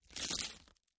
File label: FLESH TEAR, gore